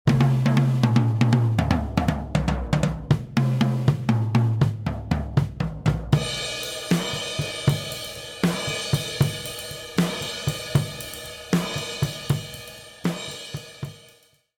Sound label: Room Mics Spaced Pair